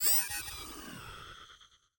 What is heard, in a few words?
Lose